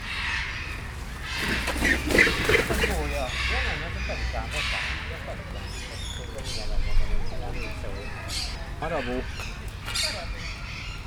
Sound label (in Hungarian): mi szallt fel, marabu; debreceni zoo 2019